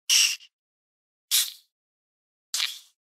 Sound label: hit